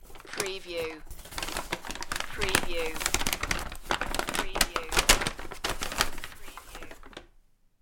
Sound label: Paper Crumple